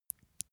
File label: eye wink, comedy cartoon; koerpergeraeusche